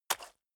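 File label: step water single left, footsteps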